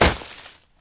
snowball breaks; Angry Birds Seasons

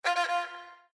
Calligraphy Hardware Fail, windows 7

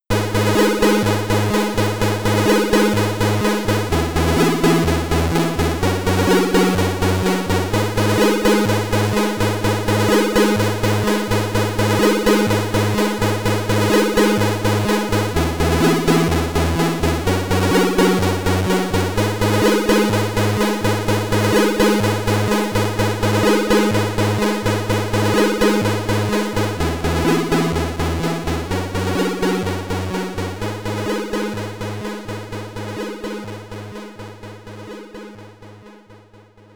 SID Stereo; Target Renegade; Commodore 64

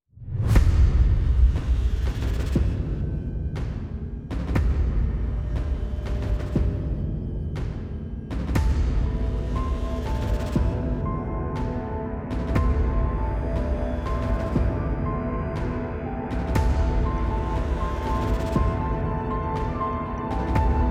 Payback; timelie

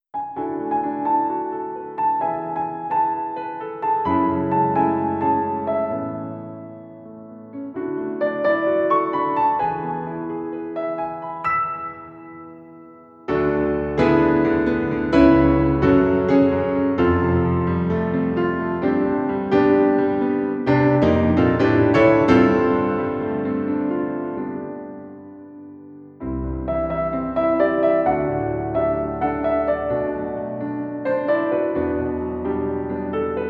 piano arrange

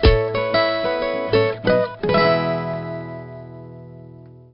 Jingle Good; tamagotchi connection corner shop 2 ds; Kuchi

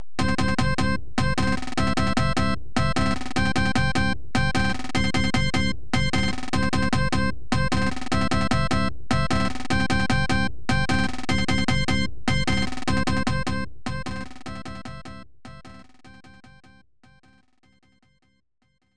Cheer Up; Super Famista 2; SNES

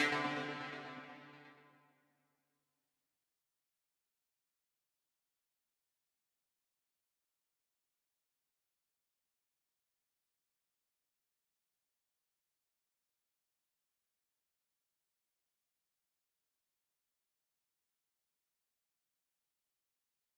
Psychedelic Mix Stem Loop Layer 4

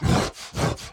Enraged Breathing Loop; Devour; The Town; devour 2021 gamerip pc macos

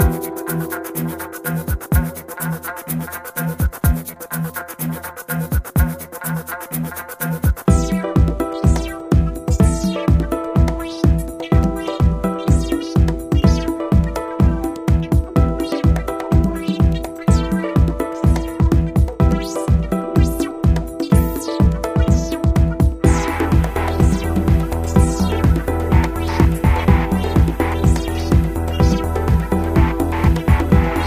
Boss fight

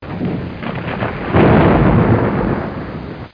Thunder

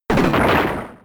Rock Smash; attack moves; Pokemon; Gen 4; DPPL HG SS

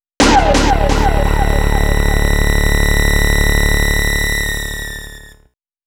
Shock

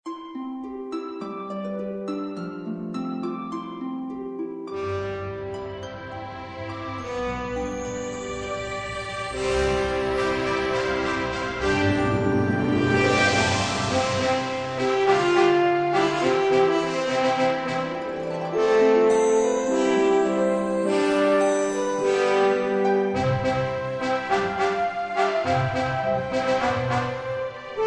Title Music; the saga of conquest; android

